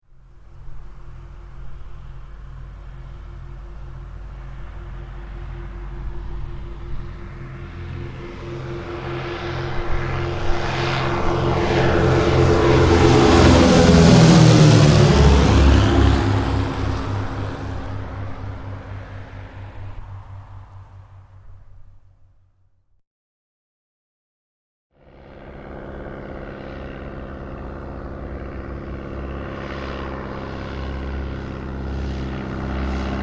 Propeller Aircraft, 2 x Propeller Aircraft passing overhead; Airplanes; Vehicles